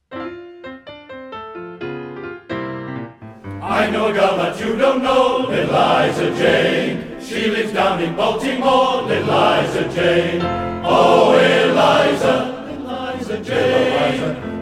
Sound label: Lil Liza Jane, Male Voice Choir